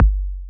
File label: Kick, House Drum One-Shots; Ghosthack; Advent Calendar; House Kit